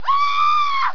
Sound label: people